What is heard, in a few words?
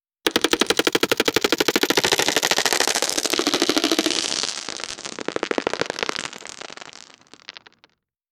rubble, debris